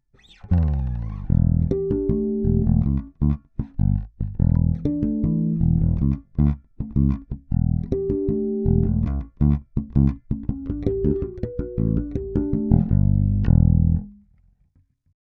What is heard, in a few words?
Instr. Bass; Octopre